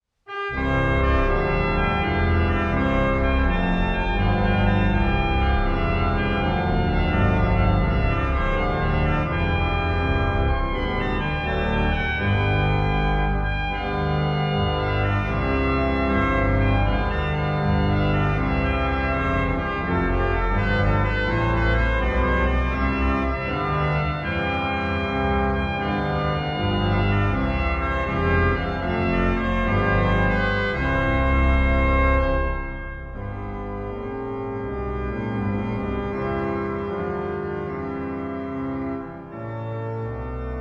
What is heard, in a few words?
tuba tune; SMP Press